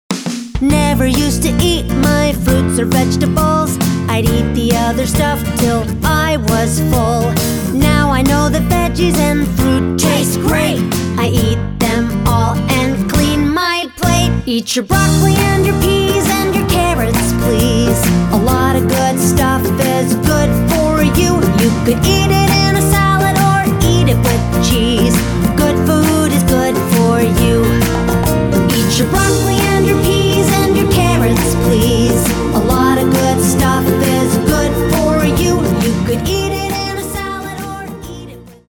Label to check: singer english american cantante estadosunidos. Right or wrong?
Right